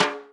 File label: snare, Millenium MPS-400